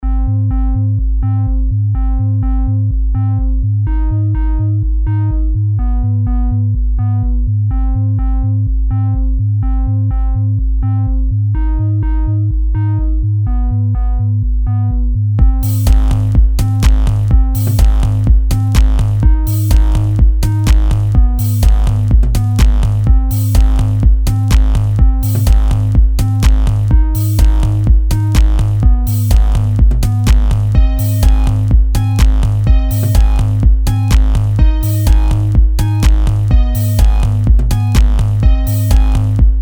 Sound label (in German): Elektro